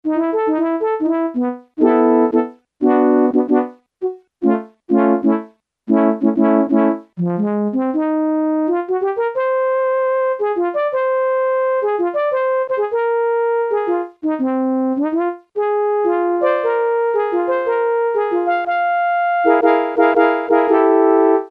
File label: HORN, Yamaha SHS-10 factory voice